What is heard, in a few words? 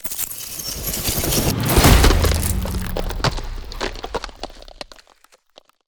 Ice Spell Freezing Slowing Chill Zone; Arctic Aura